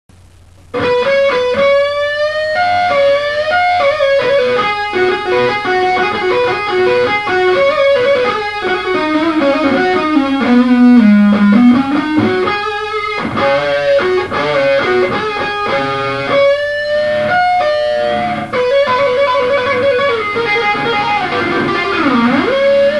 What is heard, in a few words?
solo